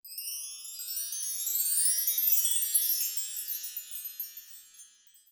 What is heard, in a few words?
Chimes Rising, Wind Chimes; Sonic Foundry (Sony Creative Software); Joe Vitale Latin Percussion